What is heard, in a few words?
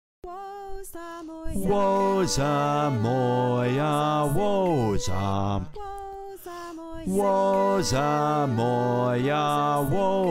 Bass, choir